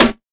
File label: short snare a sharp key, steel snare drum sound tuned to the A# note